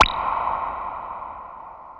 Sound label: Water Drop; FREE SFX PACK VOL 3